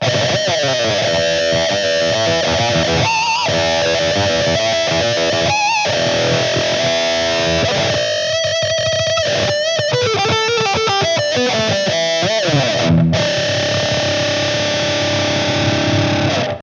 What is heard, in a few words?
wah tone MIX